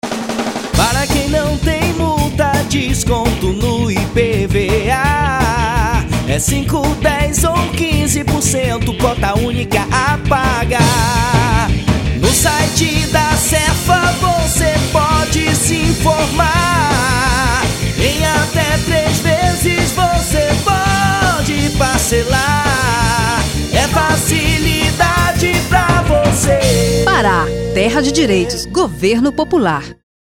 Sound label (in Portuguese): Jingle Rock; IPVA